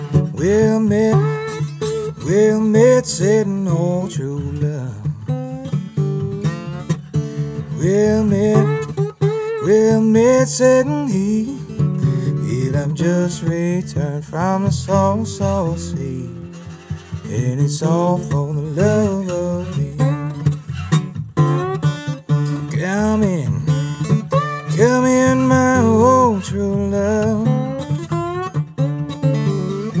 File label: blues